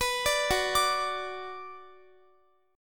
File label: Gb Suspended 4th Sharp 5th Gb, down, Guitar; arpeggiated